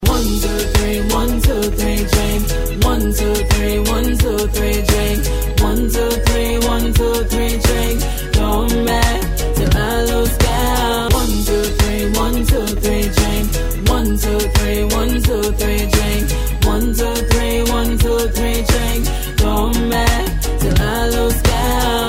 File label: Reggae